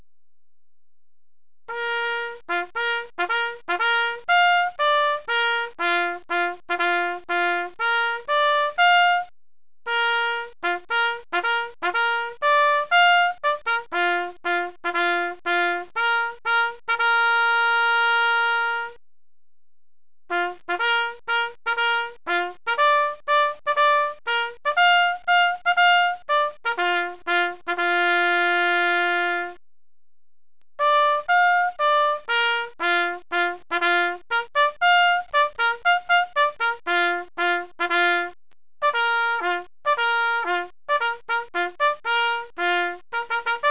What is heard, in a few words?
tattoo